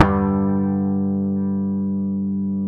G, Bass; Sampleheads; Will Lee Bass Library

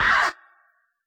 SCR, Yamaha DJX, B900 Kit

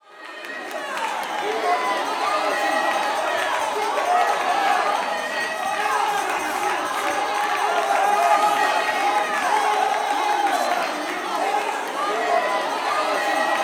WORSHIP, WORSHIPAMB, Vocal Planet, Gospel

